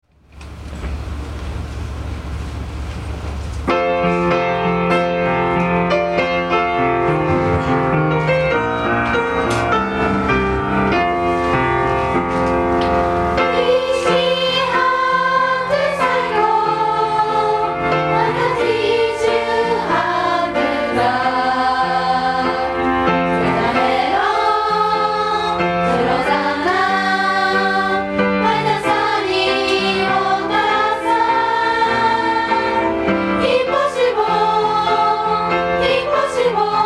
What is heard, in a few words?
heron song live